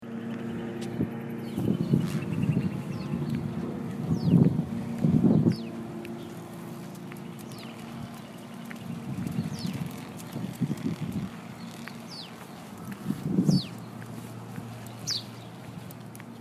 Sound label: Spring